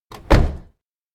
door truck close; anemoi

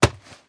receive radio; anemoi

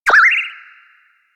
elec fuwa drunk; anemoi

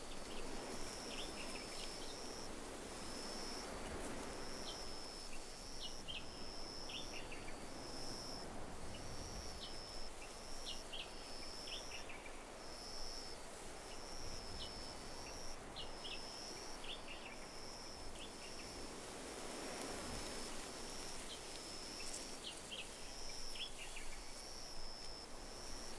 outdoor mountain road night

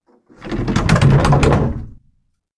door janitor room slide close; anemoi

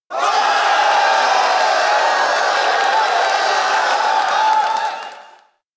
crowd shout ooo; anemoi

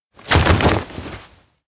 cliff jump; chiharu; anemoi

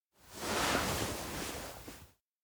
futon exit soft; anemoi

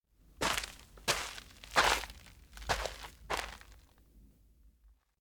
steps outdoor ground to school; anemoi

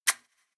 face close; awayuki

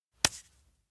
hold hand; rikka; anemoi パッケージ版 1.1

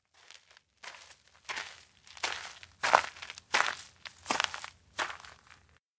steps outdoor grassland walk coming; anemoi

